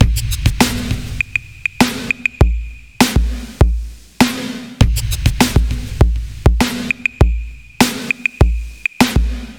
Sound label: 100 Beat; Warhead; Zero-G - Future Wave